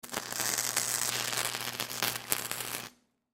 Arch Welder Spark, Arch Welder Crackle Short Electricity Spark; Machines; Industry; Sound Effects:Machines; Blastwave